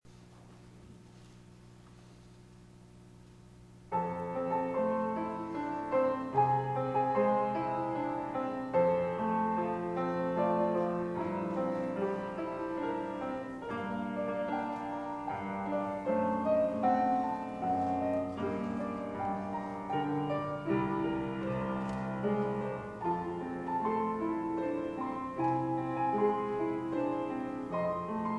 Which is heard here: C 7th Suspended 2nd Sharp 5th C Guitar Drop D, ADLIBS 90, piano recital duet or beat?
piano recital duet